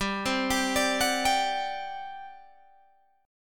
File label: G Major 7th Suspended 4th, G, down, Guitar, Open G